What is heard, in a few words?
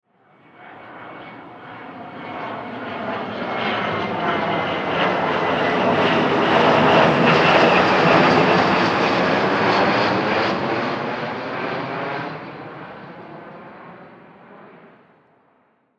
Jet Private Jet Fly By Overhead; Transportation Aircraft; Airplanes